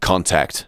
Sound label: vox; Ueberschall; Techno Trance Essentials